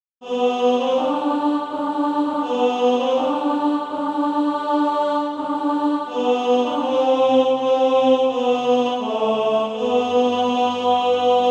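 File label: tenor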